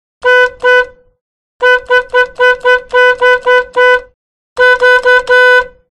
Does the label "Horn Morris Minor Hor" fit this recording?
Yes